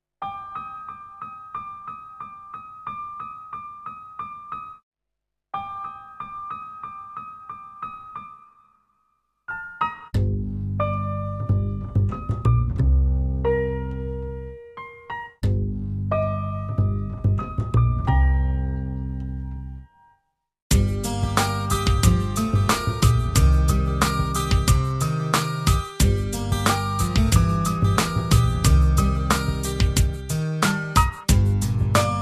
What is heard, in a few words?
acoustic; sarmacja